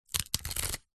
foley raffle ticket book ticket rip out performated slow; zapsplat